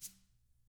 TAMA, Drums; ILIO; Double Platinum Drums 1

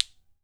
ILI ILI, HAWAII PERC; AKAI S6000 CD-ROM; WorldSounds

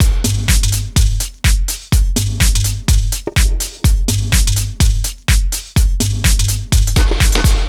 Full Loop 125, Loungin House; Equipped Music

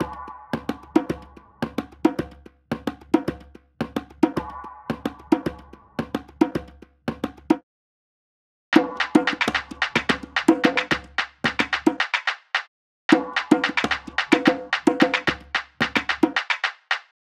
loop perc 110; koronis; Seismic Loops; SEISMIC2

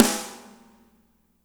MED SNR, natural kit; Spectrasonics; Burning Grooves CD2

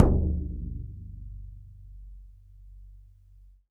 BODHRAN, Ireland; E-MU Producer Series Vol. 7 – Old World Instruments

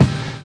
WHISTLER; Univers Sons

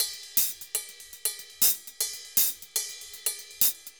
Ride Samba 120, Drums & Percussion; 120 bpm; Best Service; Festa Latina VOL-1

